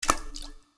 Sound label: Water fold open; Nokia Ringtones; Audio themes